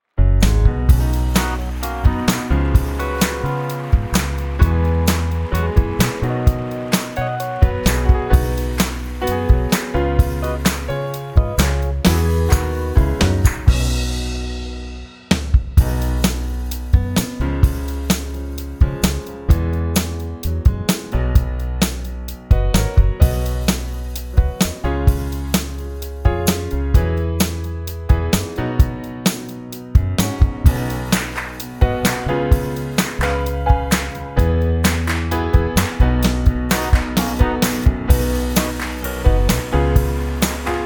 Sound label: Instrumental Jingle; Canzell Realty